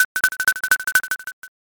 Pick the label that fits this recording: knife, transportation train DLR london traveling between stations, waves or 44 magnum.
knife